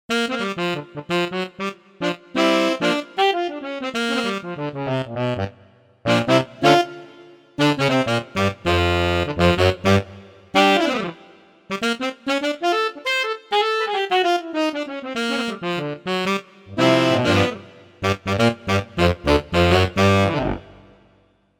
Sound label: Sax Ensemble